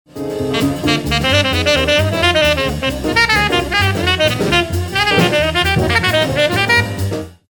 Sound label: sax